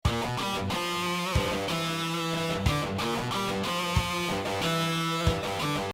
even odds riff

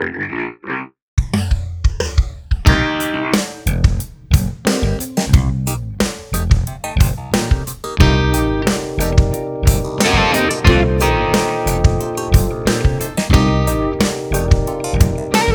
funk

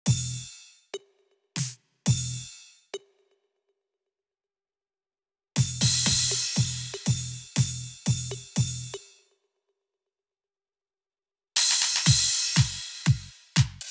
Big Room; Ringtone

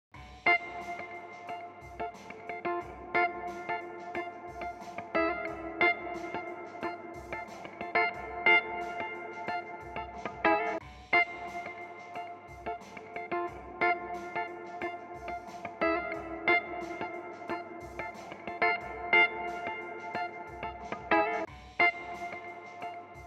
guitar

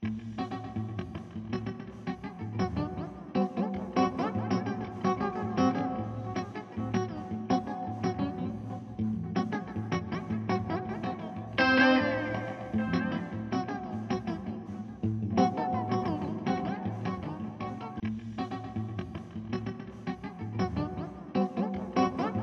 guit

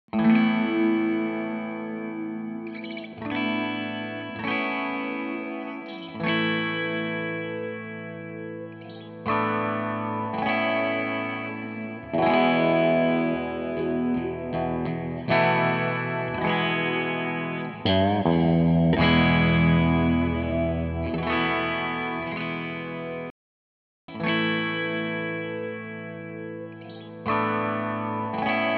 guitar

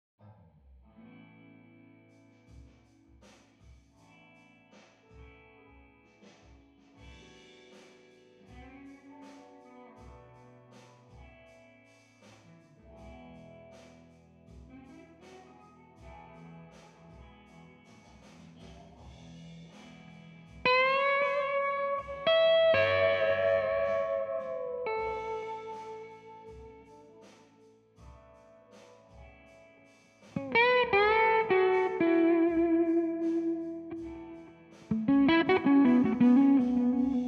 guit solo and funk, fill

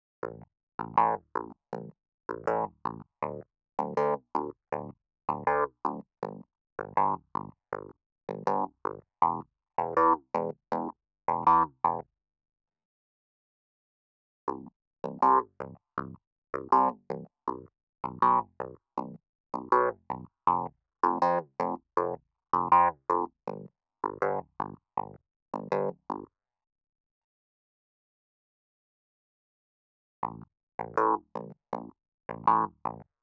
Clav; Pawnshop Clav

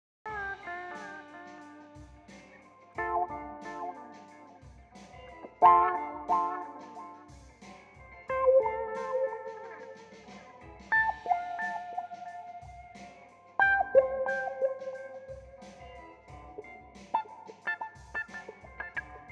wah

